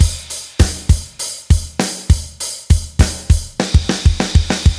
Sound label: Crystal Drums; Metal (Метал)